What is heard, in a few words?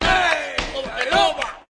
heyy folk chorus, MALE SINGING